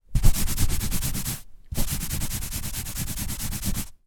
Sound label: foley rub scrub carpet cleaning